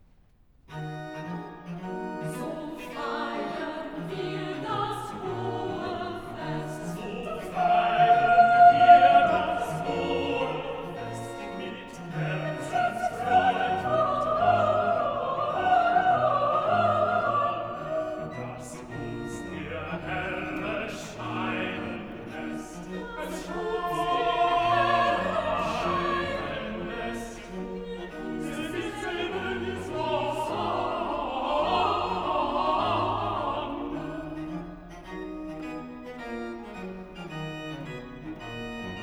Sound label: Soprano Tenor; vocal; BACH; BACH for SOPRANO; BWV004; Versus VI; So feiern wir as hohe Fest